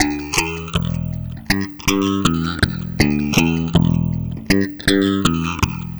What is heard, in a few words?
Distorted Bass, Electric Bass; Sonic Foundry (Sony Creative Software); Leo Cavallo Bass Taster